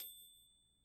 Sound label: TYPEWRITER, BALLROOM